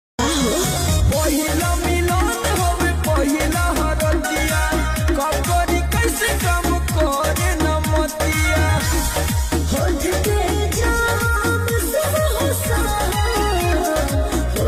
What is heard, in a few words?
Ringtone